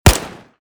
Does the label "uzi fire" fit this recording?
Yes